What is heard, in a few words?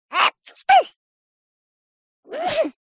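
cartoon sneeze sound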